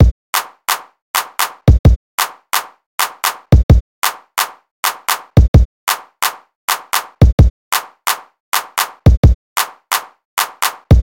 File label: BEAT